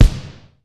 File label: Bd Ruff, Kick Drums; Zero-G; Soundclash